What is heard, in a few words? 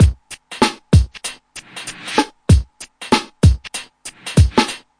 round hiphop, drum loops and breaks, 96 bpm; 091 to 100 bpm